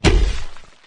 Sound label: die, flood infection